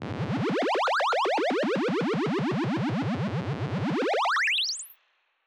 fx oneshot siren sweeps complex osc long spring verb; Deep Space Textures